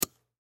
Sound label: Bullet Shell; Bullet Shell 12 Gauge Drop Sand; Drops (Sound Effects:Objects); Blastwave; Warfare